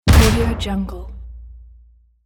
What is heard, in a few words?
shoot